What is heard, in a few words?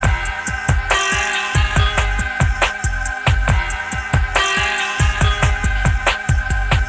Loop